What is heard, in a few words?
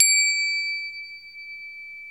NEPAL, Nepal Cym; Club-50 - Foundations Roland; ExotcMetal